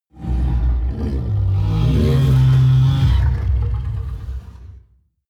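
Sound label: coloss groan deep long, creatures; kreaturen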